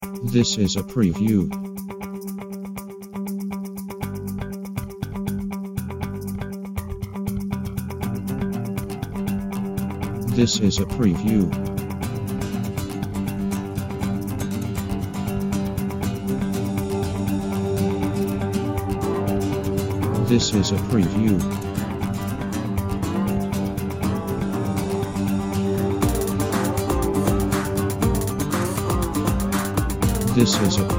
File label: Magical Guitar Theme Techno